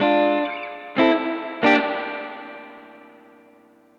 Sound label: Guitar, 70s Analog Funk